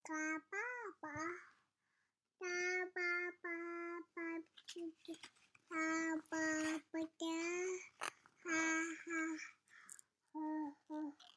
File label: singing